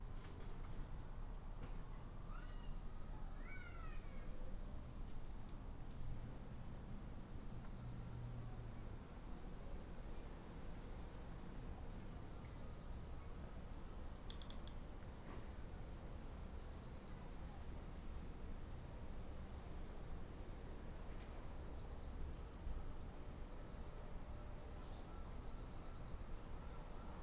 Afternoon Apartment Ambiance; Working With My Grandma 4.28.2016

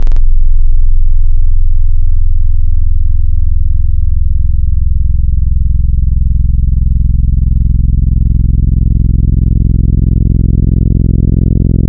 Keys Perfect Wurli; Synthetic's Serum Collection; ESW X Keys

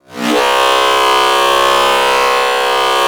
Noise Osc; Swamp; Cymatics; Shapeshift Noise Osc Samples for Serum

